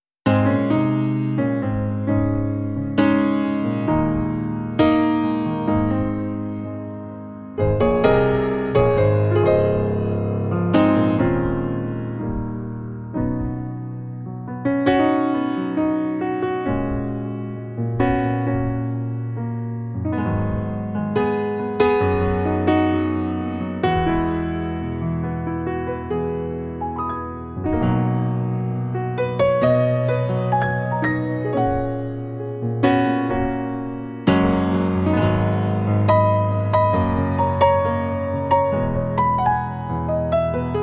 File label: Relaxing Piano Music